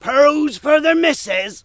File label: Market, Ambient; PC Gamer IT CD 46; CT DEMO